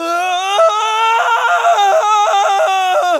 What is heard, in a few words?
fulton scream; mgsv